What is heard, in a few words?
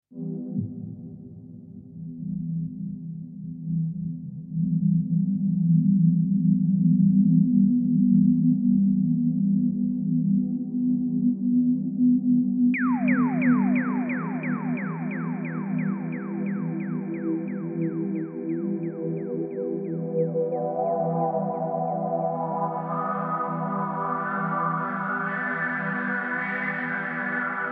sound synthesis; synthesia